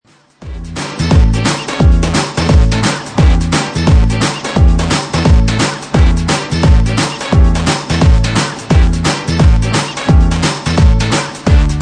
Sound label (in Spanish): Mambo